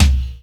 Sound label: TOM TOM; E-Lab; X Static Goldmine 2; akai